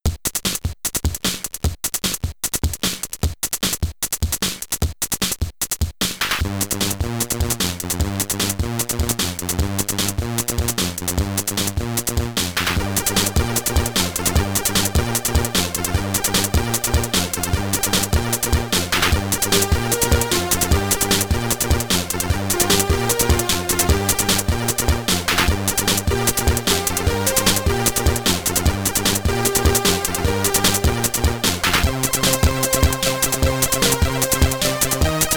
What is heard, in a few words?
SID Stereo; Slayer Commodore 64